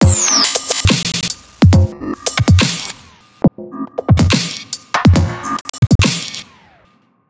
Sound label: glitch loop, Dubstep; Dubstep Sample Pack